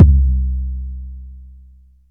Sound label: warm sounding kickdrum sound c key